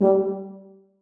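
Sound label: tuba staccato g1; Sonatina Symphonic Orchestra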